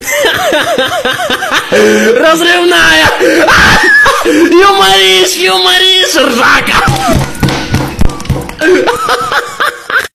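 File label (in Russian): ahaha razrivnaya yumorish rzhaka, laughter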